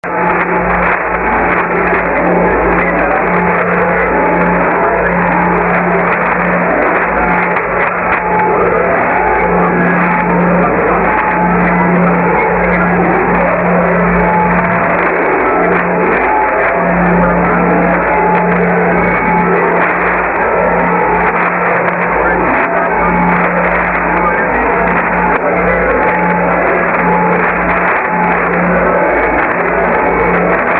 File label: North Korean noise jammer